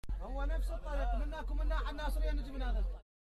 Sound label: loop